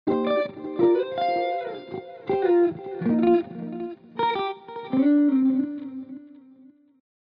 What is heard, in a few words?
Dual Delay Bucket Brigade Delay Wet; ClonEchoes; Syntheway